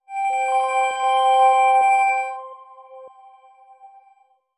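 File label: granular bell accent C major; bespoke - Floral v.2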